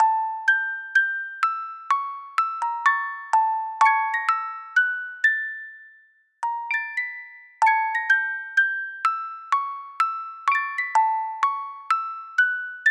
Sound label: Pondering (Music Box); animal crossing new leaf welcome aamiibo soundtrack